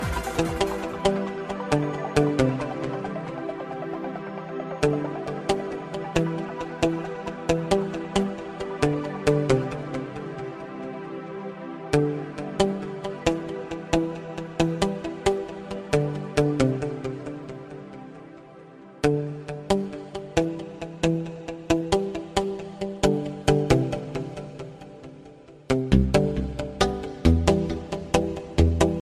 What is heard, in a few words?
trance; iphone se new mix